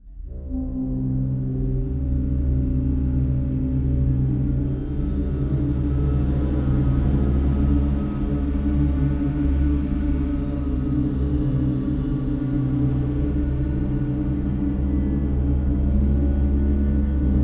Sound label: Crash Stingers; Outlast 2; PS4 Windows Xbox One